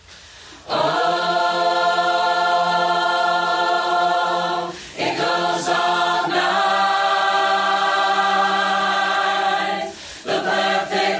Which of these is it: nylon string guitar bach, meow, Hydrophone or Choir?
Choir